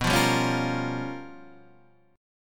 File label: G 7th Sharp 9th Flat 5th